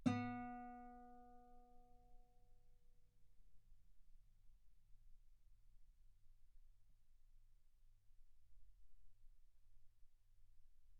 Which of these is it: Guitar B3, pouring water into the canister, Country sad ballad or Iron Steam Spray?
Guitar B3